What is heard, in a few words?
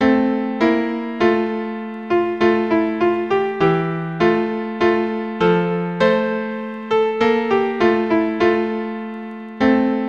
Once in Royal Davids, keyboard